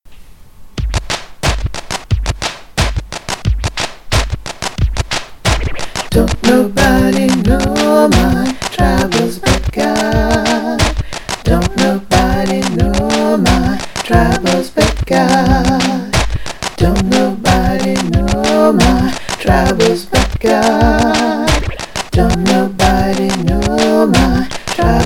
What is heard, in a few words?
TUTTI